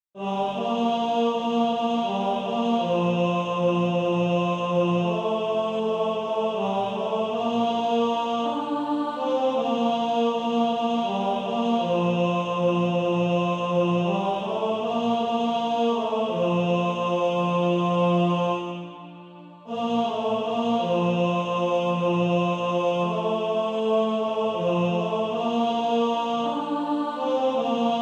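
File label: Male Choir, tenor 2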